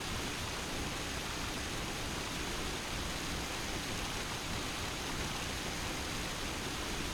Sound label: hood amb